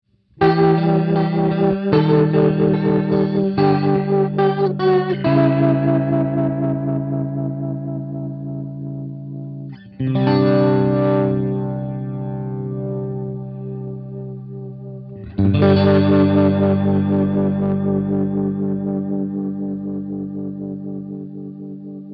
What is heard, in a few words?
Rotary